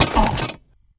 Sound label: STONE KNIGHT PEACE DAMAGE